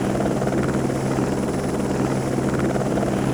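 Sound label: helicopter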